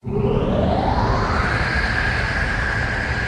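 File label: vacuum cleaner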